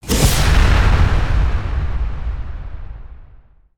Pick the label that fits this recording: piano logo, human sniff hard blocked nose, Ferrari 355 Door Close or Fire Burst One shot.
Fire Burst One shot